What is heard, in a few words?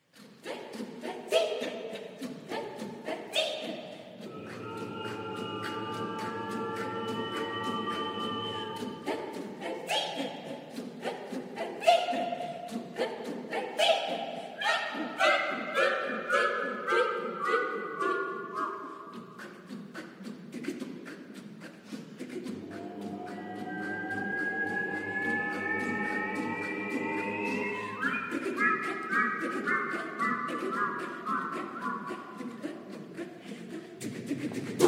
Choir; 441Hz